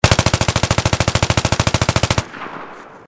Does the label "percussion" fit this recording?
No